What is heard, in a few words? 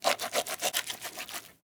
cut meat; Rust